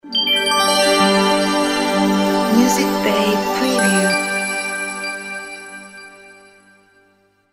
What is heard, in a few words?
Bright Short Logo